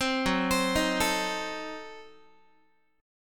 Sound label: F sharp Diminished 7th F sharp Guitar; arpeggiated; JGuitar